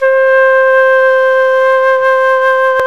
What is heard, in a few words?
Flute; CMI